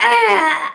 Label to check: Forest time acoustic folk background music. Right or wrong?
Wrong